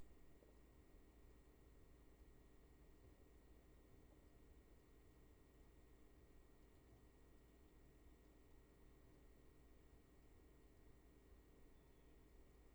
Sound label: roomtone